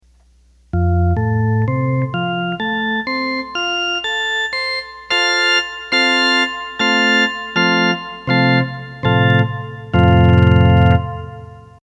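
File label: Full Organ, Roland accordion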